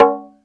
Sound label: bata; AKAI S-Series CD-ROM Sound Library VOL-5